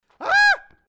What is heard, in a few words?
squeal